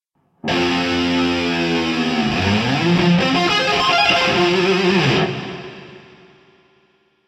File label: lick; brutal legend